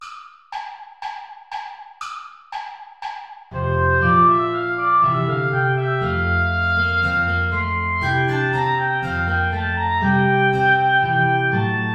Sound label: bourree play along without guitar; praetorius michael